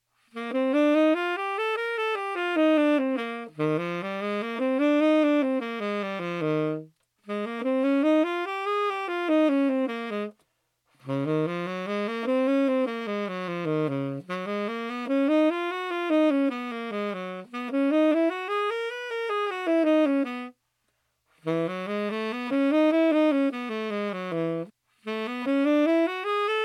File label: major scales all together, saxophone